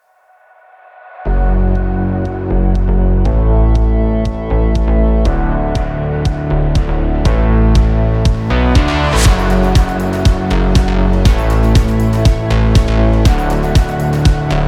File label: indie electro sport commercial